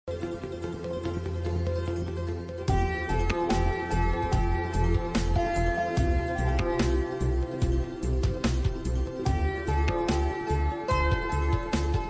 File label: Ringtone Instrumental